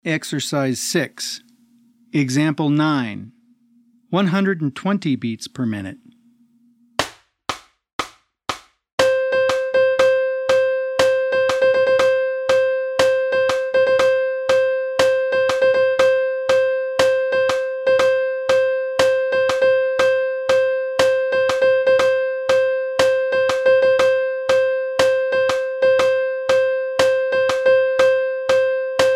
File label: 120 BPM